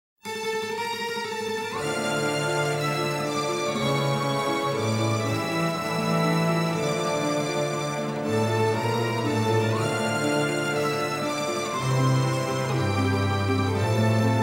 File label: Music- Mobster Theme